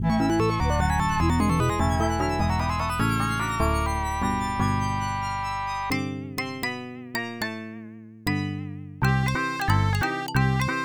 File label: Waltz; Pac-Man 2 The New Adventures; Genesis